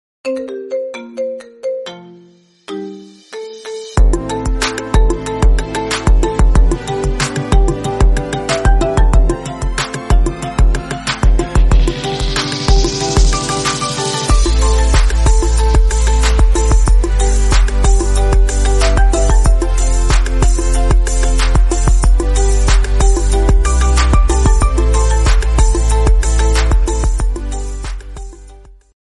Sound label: Marimba